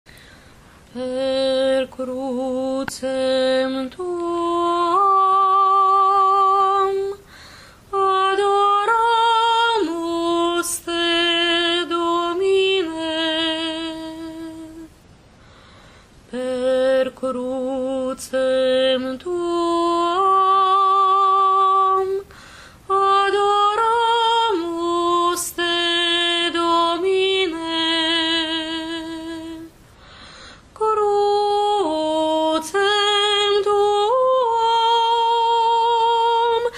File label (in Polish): sopran; Per Crucem tuam; Wielki Czwartek; spiew na triduum